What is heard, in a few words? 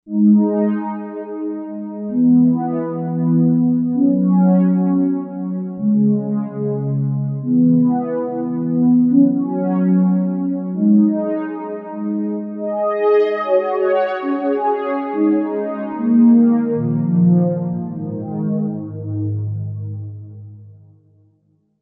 Horny 5ths, Novation UltraNova; Factory Patches